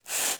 Smoke deslizandose por la tierra

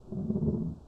Ruedas

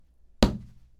Golpes

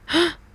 Sorpresa

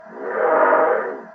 Hormigonera

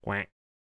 Graznido

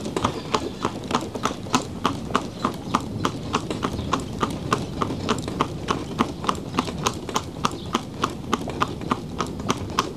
Trote

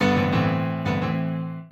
Pianos Y Teclados

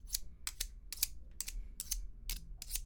Tijeras

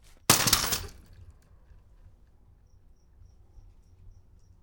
Caida Bici

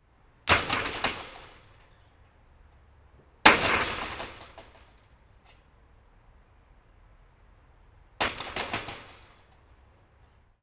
Reja Metalica Valla

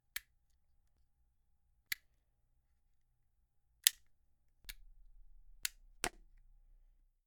Clic De Abrir

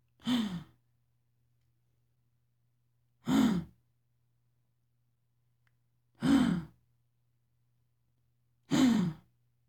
Sorpresa

